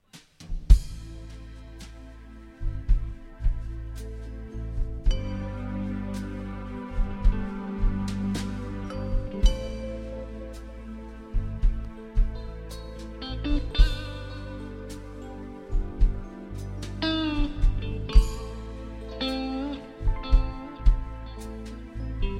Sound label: thunder and storm